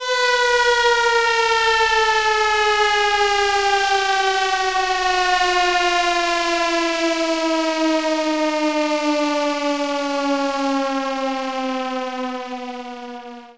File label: ALARM; XXL 800 Production Effects